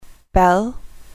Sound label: bell; english